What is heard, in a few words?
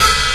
sharp open hat sound e key; Duration 0:01